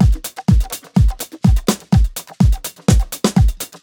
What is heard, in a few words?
Beats, Nu Jazz House, 125; PowerFX